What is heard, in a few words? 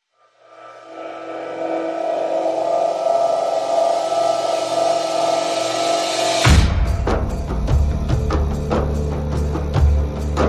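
Percussion and Pads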